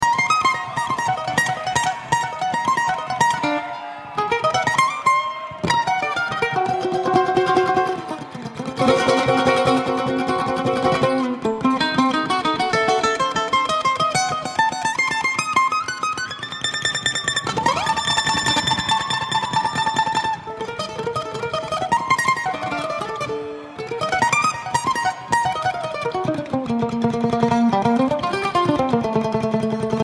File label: Mandolin